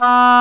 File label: Oboe f; Roland L-CDX-03 Disk 1